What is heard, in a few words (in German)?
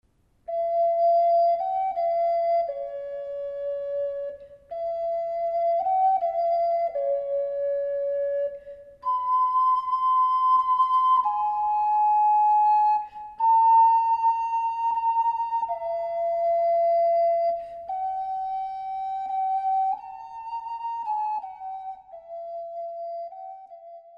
Stille Nacht, ocarina